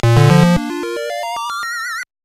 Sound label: Unknown Jingle; Linus Spacehead; Quattro Adventure; NES